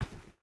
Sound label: footstep rubber boots dirt walk; Rust